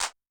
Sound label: Clap, Yamaha DTXpress-II snare